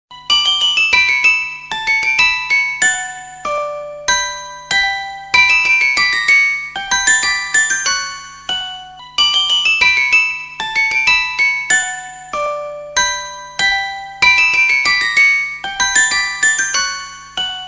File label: cute music box; ringtones